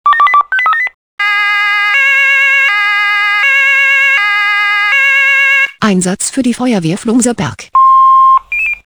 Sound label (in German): SMS Alarmierungstone; FW Flumserberg